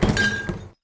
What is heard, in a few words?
Drop Bottles; Lethal Company